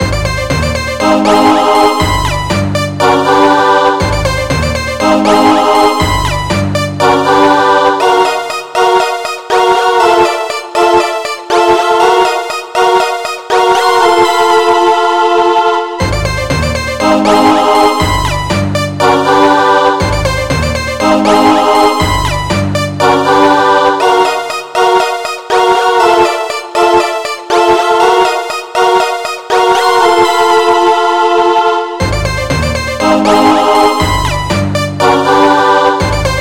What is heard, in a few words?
Creepy; SMW